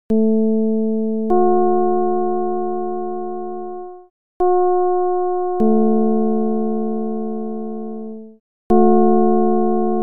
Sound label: csound foscil 220hz